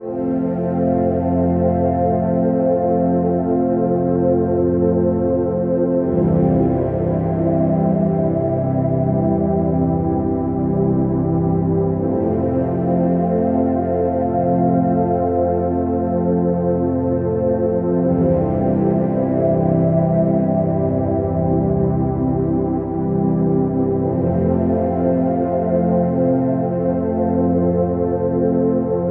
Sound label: Meditation Track Night